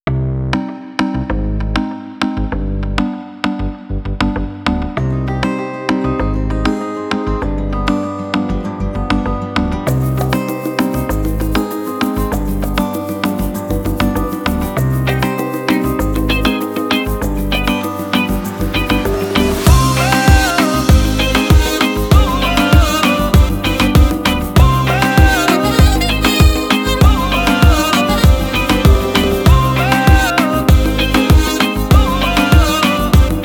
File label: ispanyol latin rageaton mandolinya; By Burak Sound Bank; latin ispanyol tema vol 3